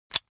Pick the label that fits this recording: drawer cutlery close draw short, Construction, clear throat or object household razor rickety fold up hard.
object household razor rickety fold up hard